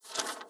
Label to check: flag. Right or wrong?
Right